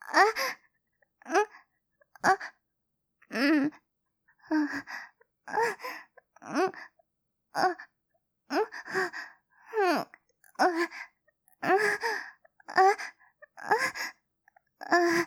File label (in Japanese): 喘ぎ声 中